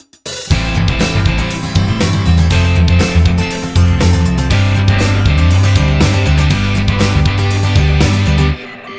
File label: sus4 guitar